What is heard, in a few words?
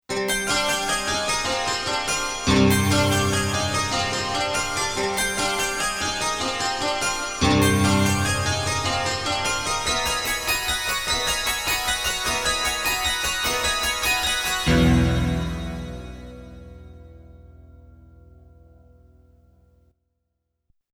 Gamelan Ens; xp-80; roland; roland demo cd-3